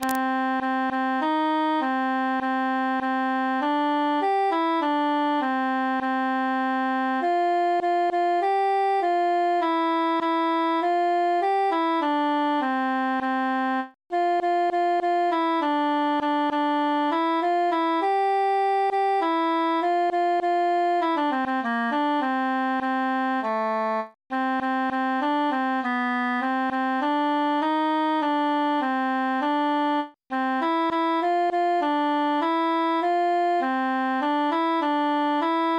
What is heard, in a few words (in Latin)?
altus solo; matona mia cara